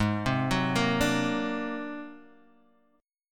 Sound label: Ab 9th Flat 5th, Guitar, Open G; 6,5,4,3,3,x